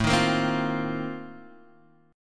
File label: Bb Major 7th Suspended 2nd Suspended 4th